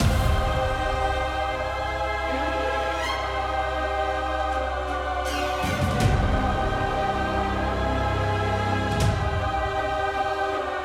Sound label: Battle Boss; Eon Altar; Battle Allevia Boss